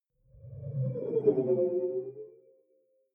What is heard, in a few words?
sci-fi display transmission damped